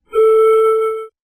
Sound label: PanPipe second; music